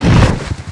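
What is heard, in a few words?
wood box scrape; XBOX; Freestyle Street Soccer; In Game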